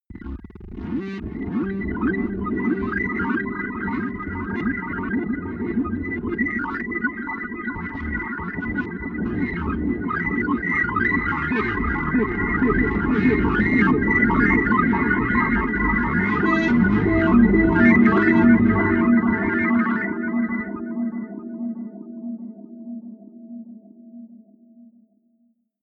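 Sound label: messy randomness, pads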